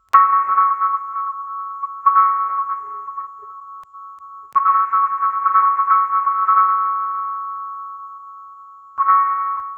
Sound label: a unique sounding vintage, bass heavy